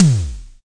Simmons SD-5, drum machines

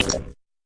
fx drum, Electro FX

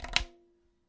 arabic perc, Arabic Percussion Kit

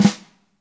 real, drum kit; REAL LIVE KITS; A Real Freakin Drum Kit

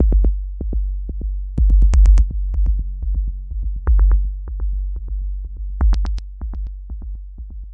124 bpm, four varieties of kick

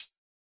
urban trap hh; Hip Hop; Urban Trap Kit; style kits

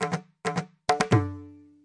129 bpm, short tabla riff

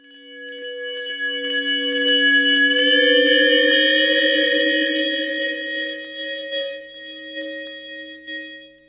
phasing bell tree riser; Bells

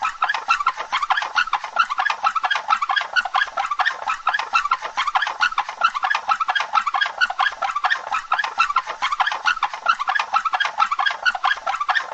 vintage squeaky wheels, cartoon sound effects; UNUSUAL KITS and FX

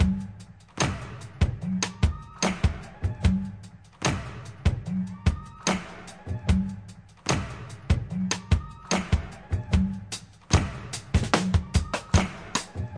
074 bpm weird busy roller, drum loops and breaks

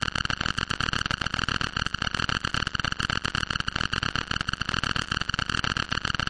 dirty drive, Atmospherics

